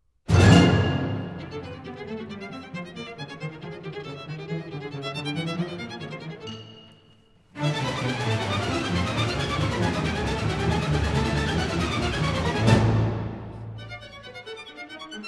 Serenade For Violin, String Orchestra, Harp & Percussion; Bernstein